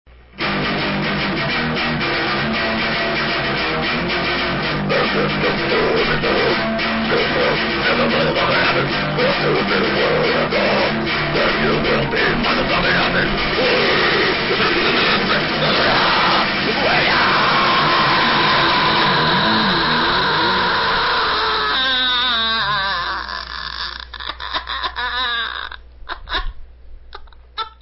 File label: sappy